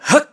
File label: Run; Link; zelda sounds; Skyward Sword